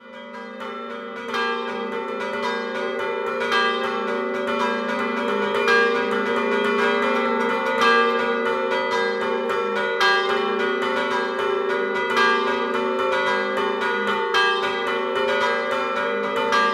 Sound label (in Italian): Lonca Scampanottata